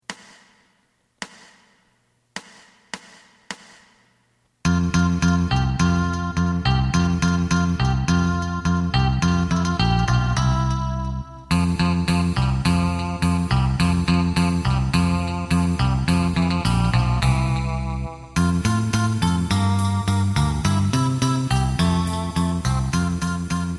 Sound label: lead